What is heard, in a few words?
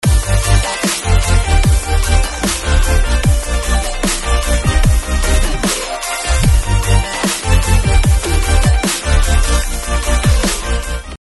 automation bass; futurebass flstudio